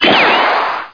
You win zap; MacAddict; Stay Tooned Demo

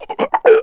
choke; PC Gamer IT CD 41; Daikatana; superfly

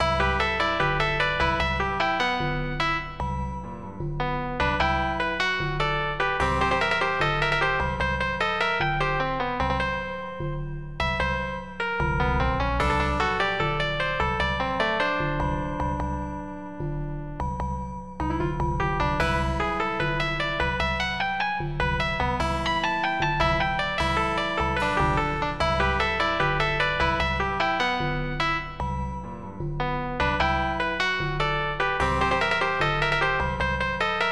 holo; Flashback Demo

